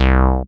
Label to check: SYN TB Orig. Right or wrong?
Right